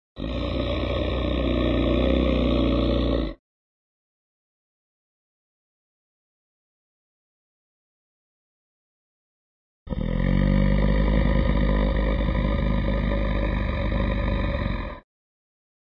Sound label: dark darkness